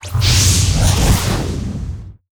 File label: flash beam